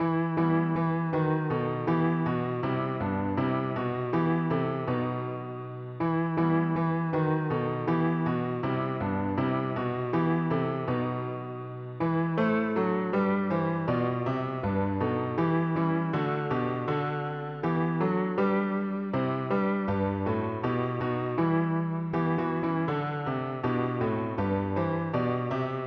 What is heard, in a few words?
God rest ye merry Gentlemen Bas; Kerst